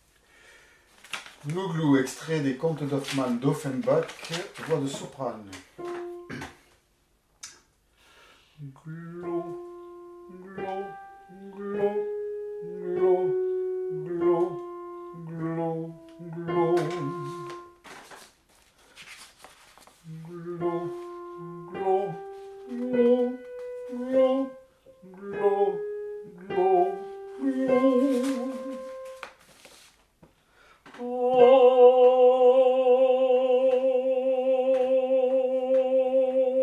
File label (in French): soprane